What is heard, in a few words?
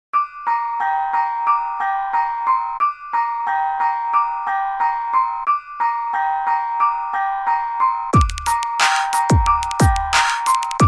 iphone 12 ringtone; apple